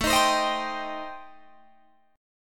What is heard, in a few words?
A-Minor 7th Flat 5th, Guitar Open G, chord